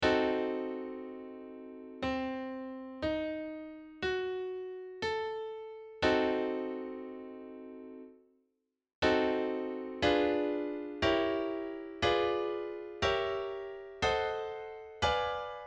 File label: dim seventh